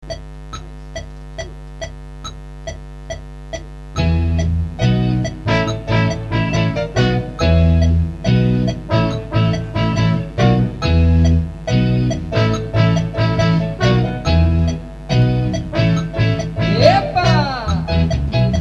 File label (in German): ohne Schlagzeug 140